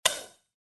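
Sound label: sounds of a person spitting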